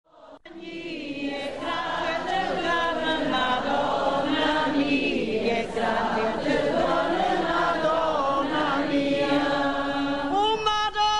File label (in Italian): Canto di chiusura della festa; Statte bbona Madonna Mia